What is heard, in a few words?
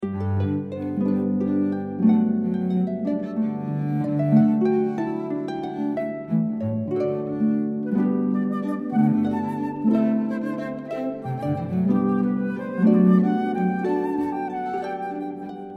Renaissance Courante, harp flute cello